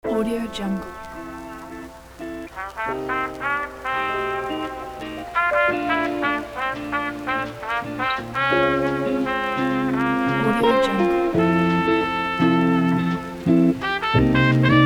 jazz lofi hip hop boom bap

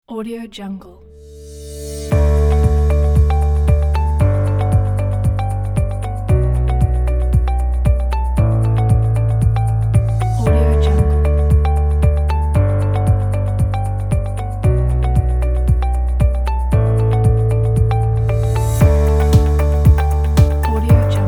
the corporate pack; blueastral

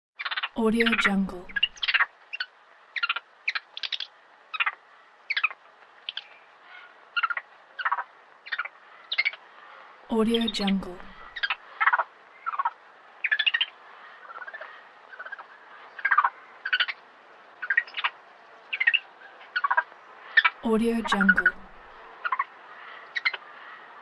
monkey

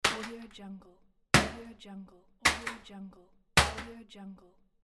drop object

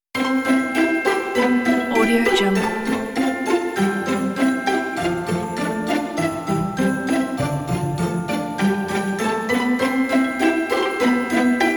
light happy christmas

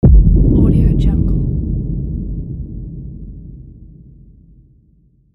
bomb explosion; alexm76

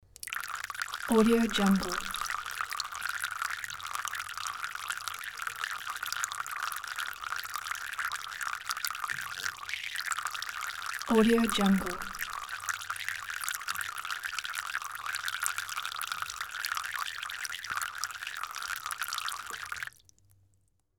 water drips in sink into pool of water and stops

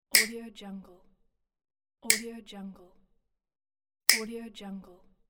wire cutters snip wire

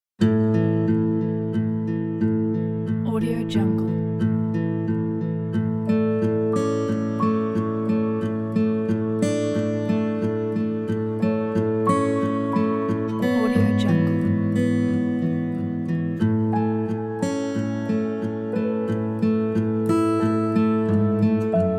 calm acoustic background

